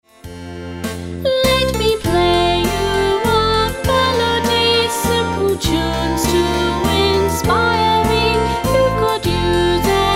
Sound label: Minuet In F; Young Mozart